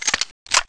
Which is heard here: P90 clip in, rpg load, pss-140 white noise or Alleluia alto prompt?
P90 clip in